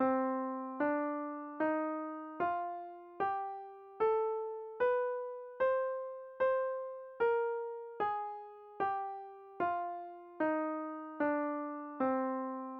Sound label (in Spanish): escala menor melodica de Do